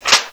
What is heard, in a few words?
mech switch